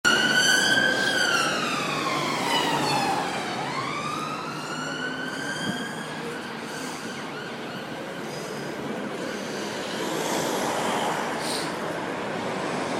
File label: bus siren